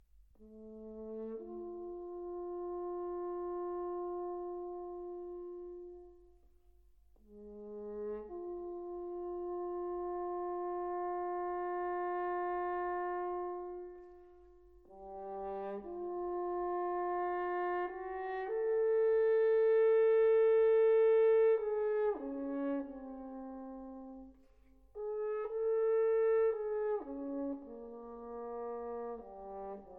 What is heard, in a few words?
Brass Quintet - III. Cavata